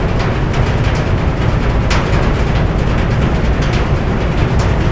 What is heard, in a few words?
pipe amb, ambience